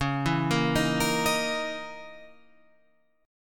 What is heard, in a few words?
C sharp Minor 6th Add 9th C sharp, Guitar Open F; C#m6add9; arpeggiated; JGuitar